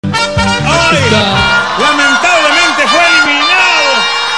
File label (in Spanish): trompeta